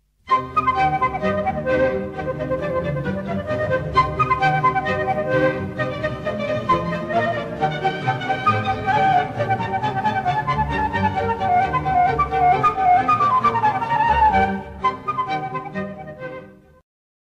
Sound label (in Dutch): Houtblazers dwarsfluit Bachfragment